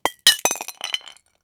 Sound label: a realistic sound effect, breaking